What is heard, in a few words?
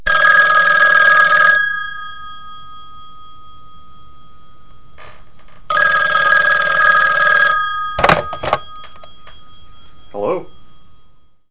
phone